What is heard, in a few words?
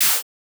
Clap Snare